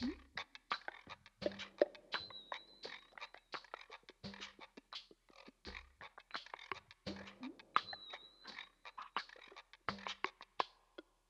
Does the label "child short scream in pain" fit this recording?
No